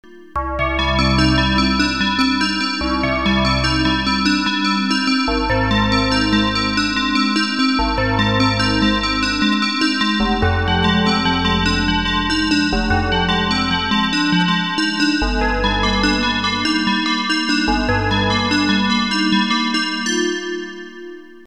bells

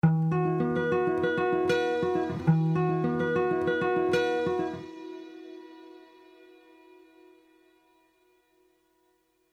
guitar high sizzle

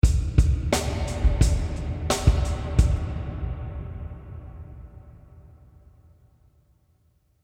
drums long verb

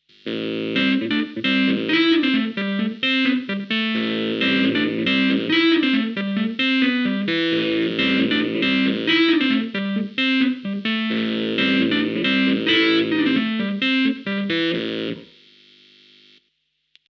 Funk Keys Biting Room

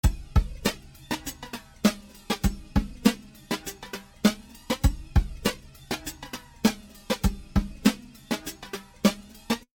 drumloop Stereo Flanger

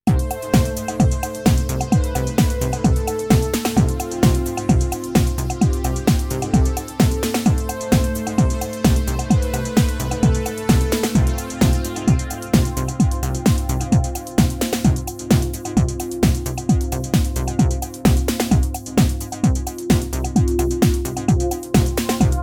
DanceBeat

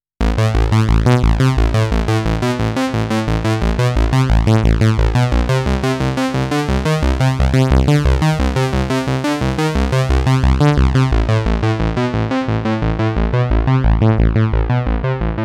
fat bassey

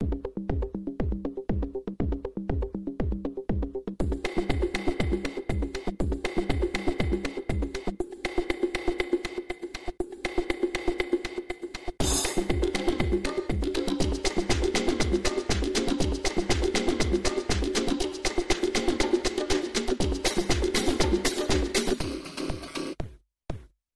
drums dry

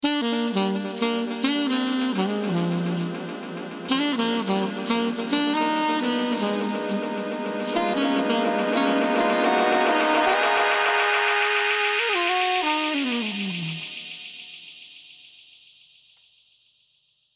Sax Dub space

